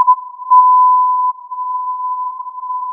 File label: filtered input